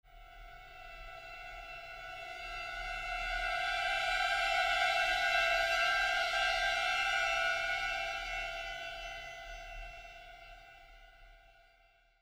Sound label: string cluster